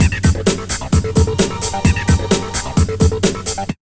HARD 130, Big Beat Drumloops

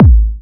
Trance Kicks, Bassdrums; Vengeance; Essential Clubsounds Vol.4

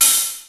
HiHat Open, Alesis D4fx

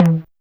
Drums Fairlight IIx

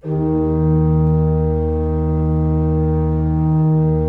16-8 D2, Cathedral Organ; Propeller Island

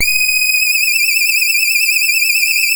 Synth and Organ; InVision Interactive; Keith Emerson

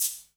PERC, 180 BPM; Ueberschall; Drum & Bass Resonance

